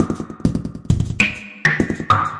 glitchy echo clicks, drum loops and breaks, 100 bpm; 091 to 100 bpm